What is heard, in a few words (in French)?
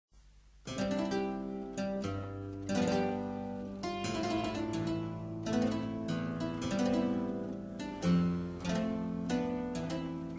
clavecin